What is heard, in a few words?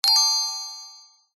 magic wand ping; zapsplat